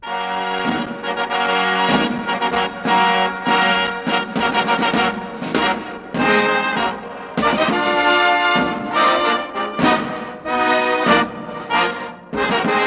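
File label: fanfares, brass group of the variety symphony orchestra; fanfaryi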